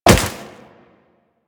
Wall Jump Snow; Footsteps; Warframe; Tenno; Wall Run

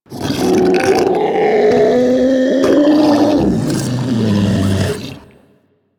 Idle, Infested; Enemies; Barks; Warframe; Ancient Shell Agent

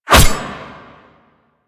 Machete Whoosh; Warframe; Weapons; Melee; KahlMachete

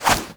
Inf Punch Whoosh, Infested; Warframe; Weapons; Inf Kick Punch

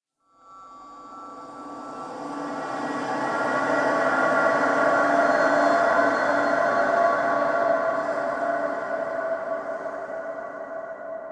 Exterior Texture, Ambience; Warframe; Rail Jack

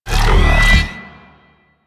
Mech Sword Slam Charge; Warframe; Vehicles; NechroMech; Abilities

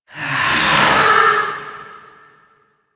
Rell Specter Vocal Attack; Enemies; Warframe; Priest Quest

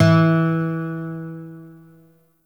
Shawzin Oct One E; Warframe; Lotus Shawzin

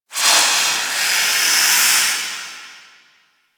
Infested Distant Breath, Ambience; Warframe; Corpus Infested

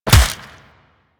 Melee Fist Impact Flesh; Warframe; Weapons; Fists

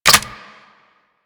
Reload Dual Pistols; Grn Queen Guard Dual Pistols

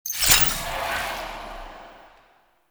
Weapon Void Reticle Depleted; Warframe; Weapons; Duviri; Incarnon Adapters

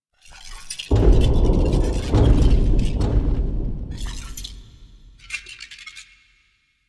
Steps; The New War; Lua War Room Part Two; Erra

